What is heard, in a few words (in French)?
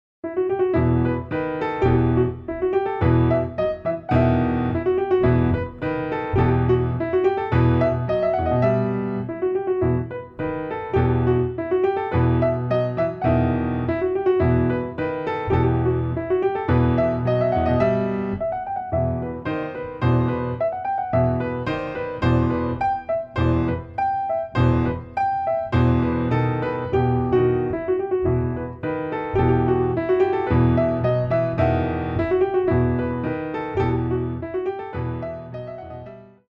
Le tambourin